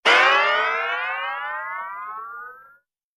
Ascending Strings, boings

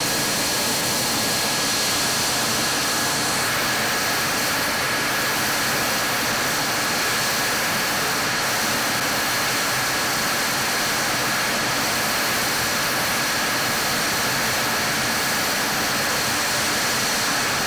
Jet Idl Multi Low, taxi

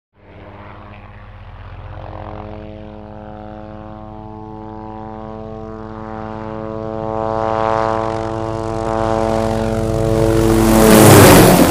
Hughes 500 Single By, hughes 500

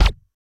Gun Shot Silencer, silenced singles

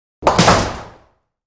Bowling Ball Hits

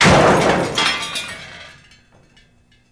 Jeep Crash Debris, car crashes

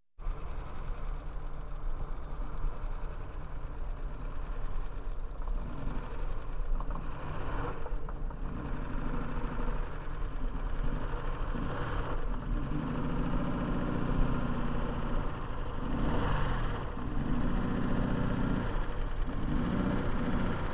Car; In Slow With Bad Lurching, Stop, Off

